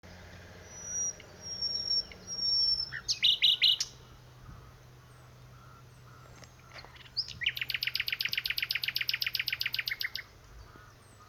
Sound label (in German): Nachtigall